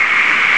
wind; ST-XX Amiga Soundtracker Sample Packs

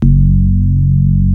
DX PIANO; Wizoo - Powered DX

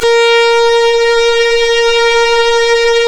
Synclav FM STRINGS A#; Big Fish Audio

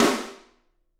SD, Drums; Bob Clearmountain Drums I

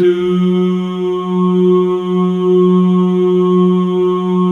DOO G 2, Synclavier Strings; ILIO

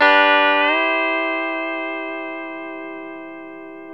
Pedal Slides, G TO C

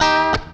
FUNKY, Grooves

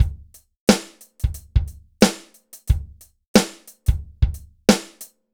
BPM 90 DRY

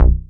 BASS C1; USB Soundscan vol.51 - House Side Of 2 Step; AKAI

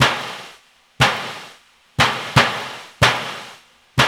Hip-Hop Gong

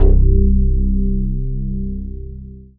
Dance Floor Bass, Multisample Basses

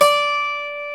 GTR 12, Ac 12+ E.12

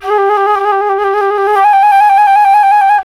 flute, D brass licks

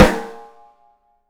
OPEN BRASS; 3D Sound; 3D Drums

